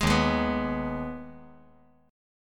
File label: D sharp Suspended 4th Sharp 5th